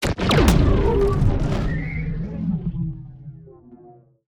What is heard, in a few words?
Sci-Fi Energy Explosion